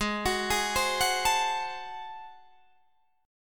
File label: G sharp 7th Flat 9th G sharp down Guitar Open D, chord